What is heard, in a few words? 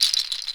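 HUSK SHAKER, CAMEROON; AKAI S6000 CD-ROM - WorldSounds; CAMEROON PRC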